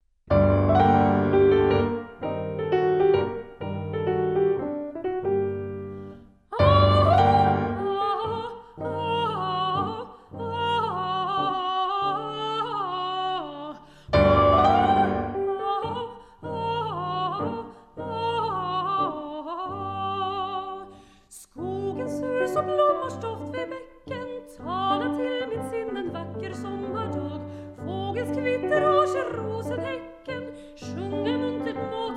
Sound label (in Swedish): Polka